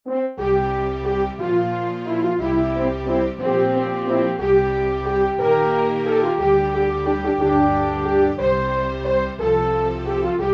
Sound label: Castle Britain, music